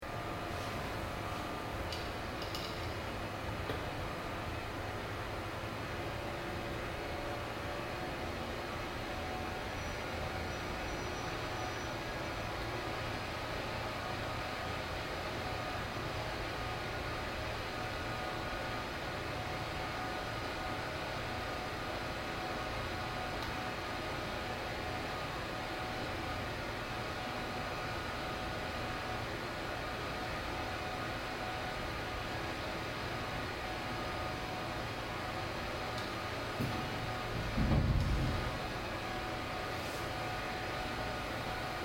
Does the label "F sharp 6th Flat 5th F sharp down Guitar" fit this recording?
No